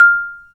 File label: Bass Marimba